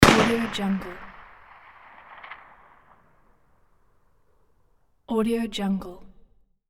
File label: gun blast single long reverb